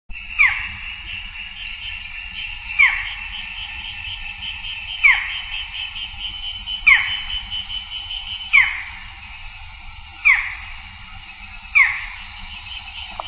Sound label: Micrastur ruficollis; PP Cruce Caballero; Jorge La Grotteria